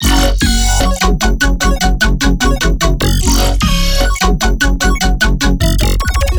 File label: Melodic Bass Loop F# 150 Chiptune, Color Bass; Loops; Ghosthack - Color Bass Essentials